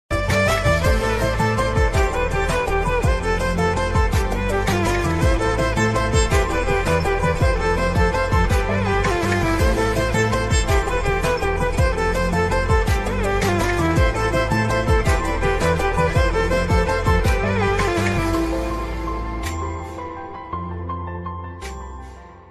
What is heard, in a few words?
song ringtone bgm slowed and reverb instrument bgm ringtone